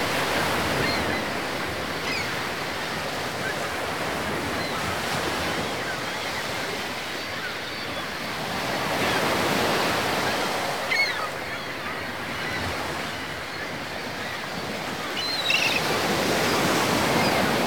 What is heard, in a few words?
beach, ambiances; CosmicRage